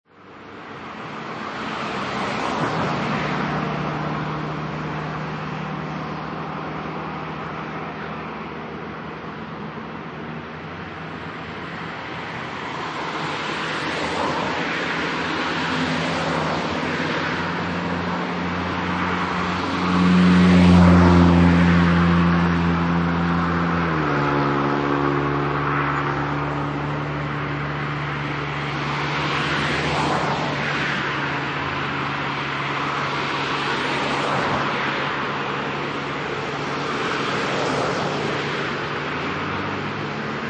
Medium Evening Traffic, Southern European Medium Evening Traffic: Cars; Cars and occasional mopeds, motorcycles travel at medium speed in both directi